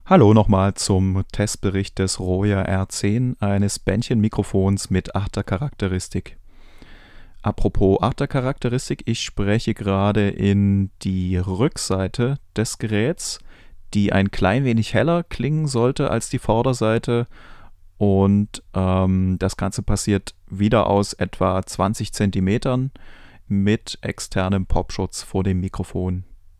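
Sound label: vocal male rear; Royer R-10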